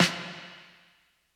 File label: 80s reverb snare